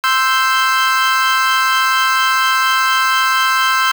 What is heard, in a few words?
pro53 c5 e5 g5 saw; 不恰当的和声; Pro 53 C5、G5、E5的锯齿波和声